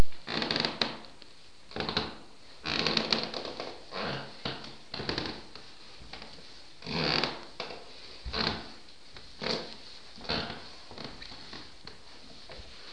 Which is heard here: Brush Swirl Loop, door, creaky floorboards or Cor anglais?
creaky floorboards